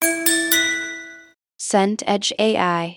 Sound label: notification double